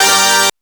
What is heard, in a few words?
stab one shot G